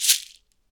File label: SHEKE, Afro Shakers, Shekeres